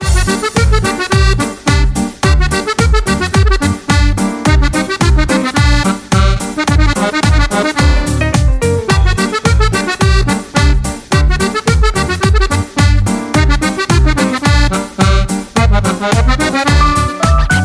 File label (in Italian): fisa swing